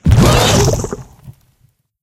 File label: Material Lava Melt Pig; Angry Birds Seasons